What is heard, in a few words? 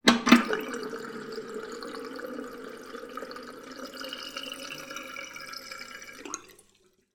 household sink water empty; sound effects one